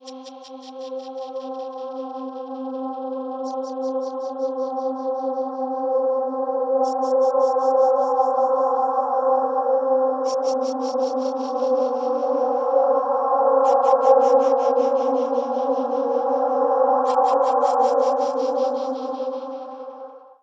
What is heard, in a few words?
microtonal void, Textures